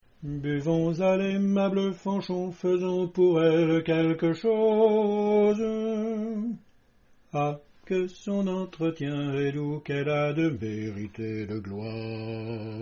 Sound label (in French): basse; fanchon